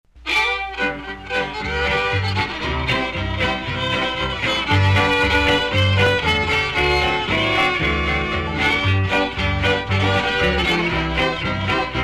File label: Shuffle; Mississippi